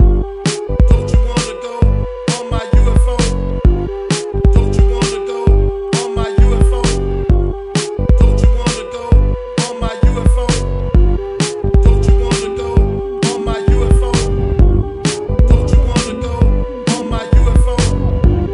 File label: random loop